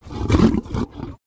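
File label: Sabertooth speak; creatures; pets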